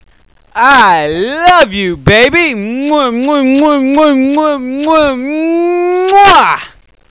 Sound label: kiss